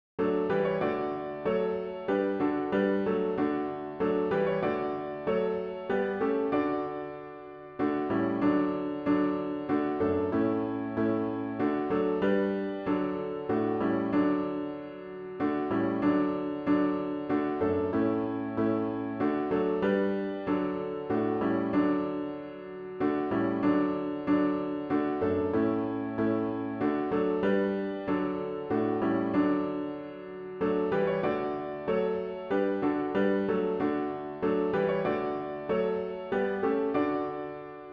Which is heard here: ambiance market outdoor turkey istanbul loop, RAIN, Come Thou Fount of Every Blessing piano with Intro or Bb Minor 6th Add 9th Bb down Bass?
Come Thou Fount of Every Blessing piano with Intro